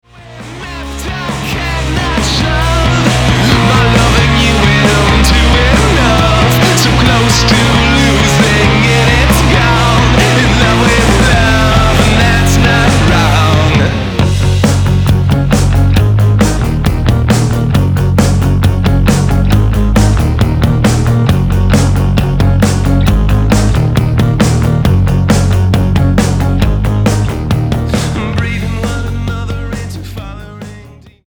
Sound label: Alternative Rock